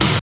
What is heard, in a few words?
snare trash; Roland L-CDX-01; Snares 5; Sn Modules 5